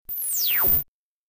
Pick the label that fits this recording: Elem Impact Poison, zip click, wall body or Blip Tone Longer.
Blip Tone Longer